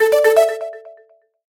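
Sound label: notification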